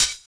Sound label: Hhat, Hihat; Equipped Music; Loungin House